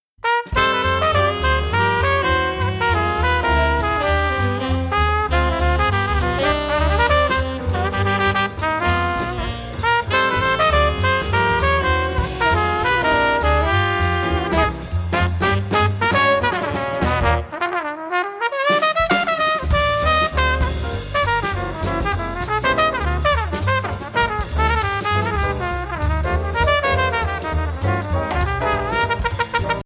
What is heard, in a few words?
Side Man Bop (With Drums)